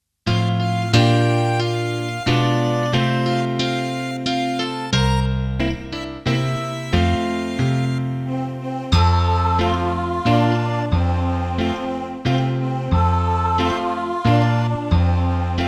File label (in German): Rap und singen